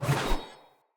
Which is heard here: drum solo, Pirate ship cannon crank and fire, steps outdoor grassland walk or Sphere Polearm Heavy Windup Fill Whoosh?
Sphere Polearm Heavy Windup Fill Whoosh